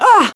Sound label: HIT; battlesnds